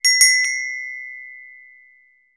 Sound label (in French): Clochette en bronze